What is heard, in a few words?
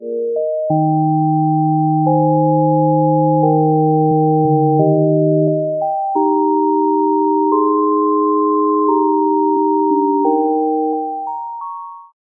Organ; Harmodion; Illusion